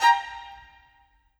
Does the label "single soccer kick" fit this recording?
No